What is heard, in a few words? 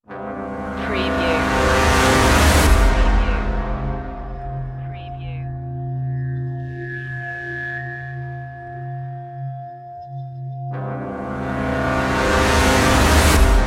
cluster trailer, Orchestral Soundtrack Suspense / Tension